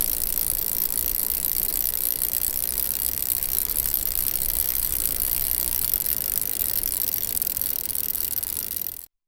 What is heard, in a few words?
fast metallic clicking, cycling